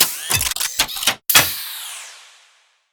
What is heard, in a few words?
Archwing Space Extraction Detach; Archwing Spack Docking